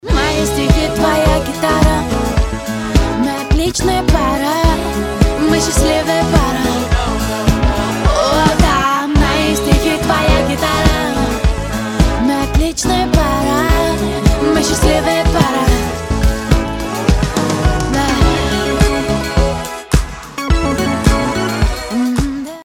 gitara

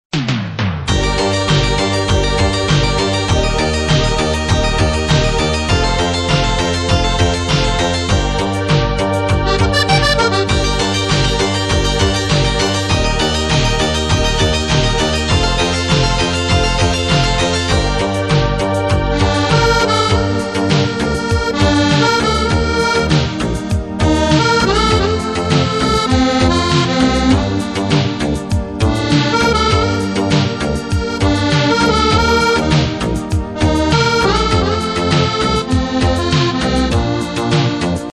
akkordeon krasivaa melodia

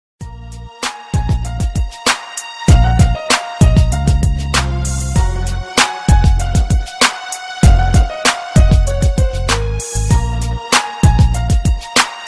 muzyka na telefon

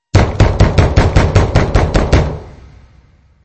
stuk v dver

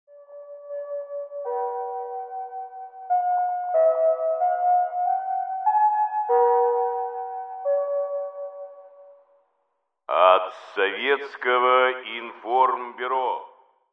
sovetskoe sms

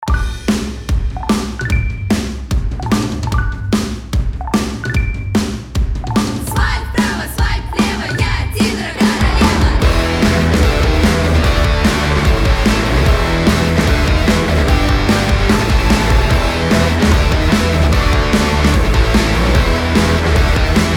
veselyj pop pank

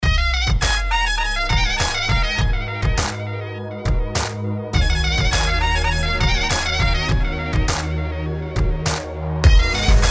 tureckij hip hop

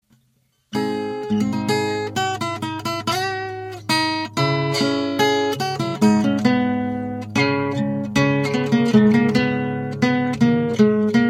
gitara; gitara iz igry stalker

